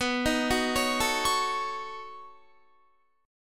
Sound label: B 9th B down Guitar; arpeggiated